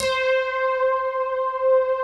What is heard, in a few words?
MUON PADS; Pro-Rec - Synthsonic